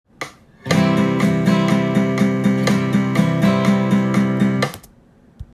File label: second guitar